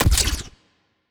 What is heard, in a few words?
evasion debuff projectile, weapons; Stellaris; biogenesis